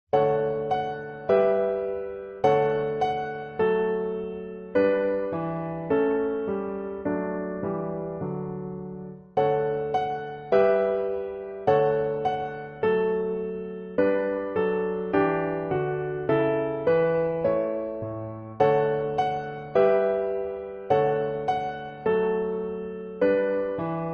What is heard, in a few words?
Accompaniment Track